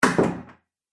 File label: Door Closed; Door Closing Sound Effects